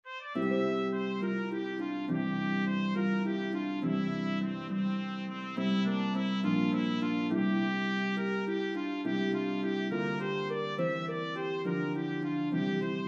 Harp and Melody Instrument in C Trumpet; Banish Misfortune

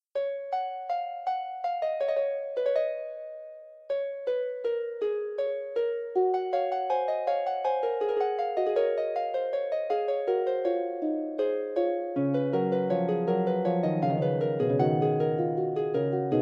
Fugue, Harp

